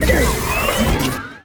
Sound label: Gun Reload Scifi, Weapons Foley; SFX Kit